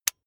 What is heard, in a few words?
electric button drill direction switch lock heavy